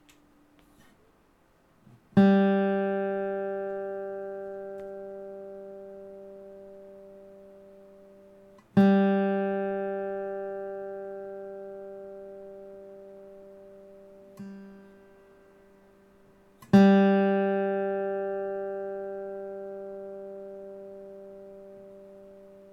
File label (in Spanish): Sonido de guitarra acústica cuerda 3 Sol G; afinar una guitarra